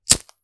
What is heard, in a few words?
Lighter